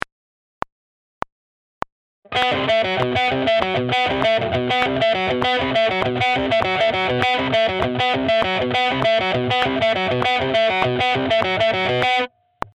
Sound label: Metal Rhythm Guitar